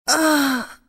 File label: death, female; csco; csgo; zbm3